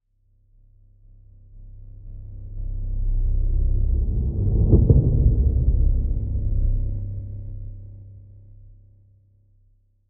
Heartbeat High Tech, Heartbeat Fades In And Out Single Beat High-Tech; Heartbeats (Sound Effects:Human); Sound Design